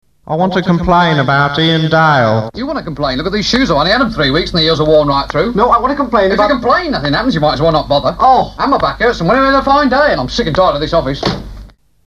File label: Jingles